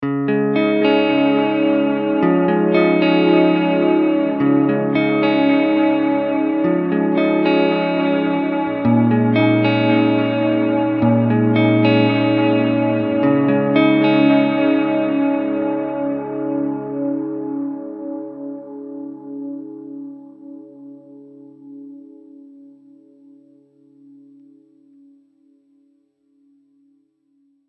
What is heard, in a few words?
digital